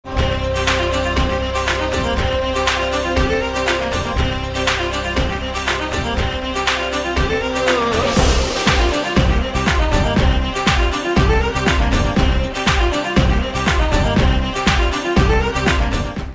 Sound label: Banjara Tune